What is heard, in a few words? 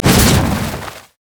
sad mummy tantrum; LoL; amumu